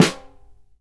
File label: SNR, Synclavier Percussion 2 - World & Orchestral